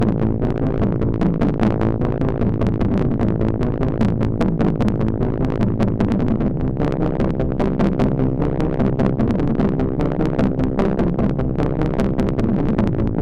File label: Machine, MOS6581; MOS6581R2